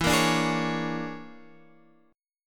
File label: Bb 7th Sharp 9th Flat 5th E